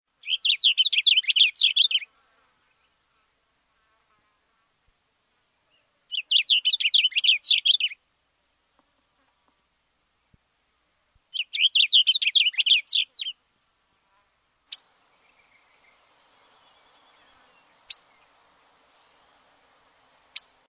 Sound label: Blue Grosbeak